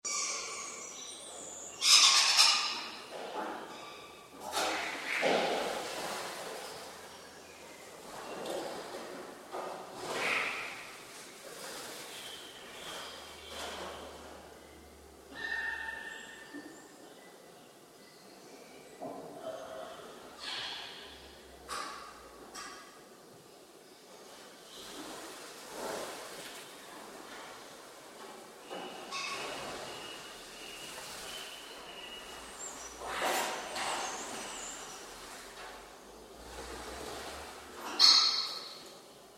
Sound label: birds abandoned warehouse